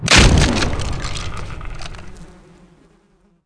impac sack

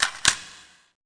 Alleg Button; SWAT2DEMO; Sierra On-Line

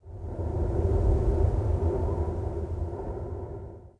WIND, wind; zones

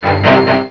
Electronica Menu; 250 Desktop Themes

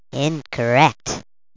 incorrec

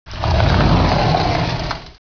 dor move

